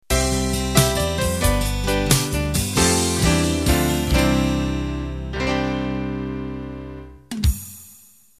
12-8 Ballad End

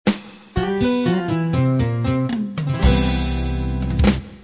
classic beat end

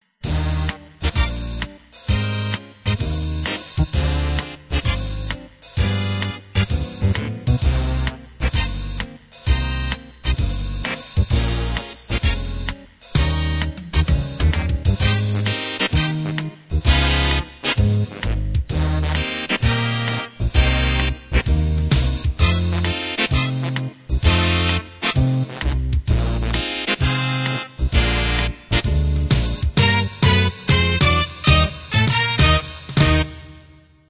swing band

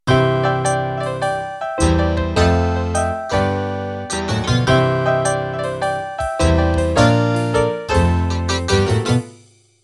Rockabilly Intro

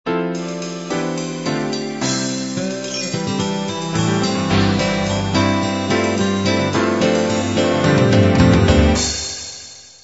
love 8beat intro

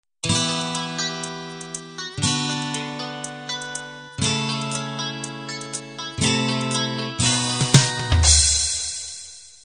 6 string beat intro

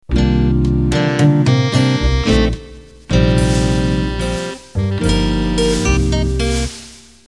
Slow Jazz Intro

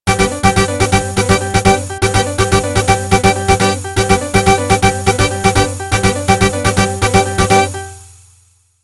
Batucada Intro

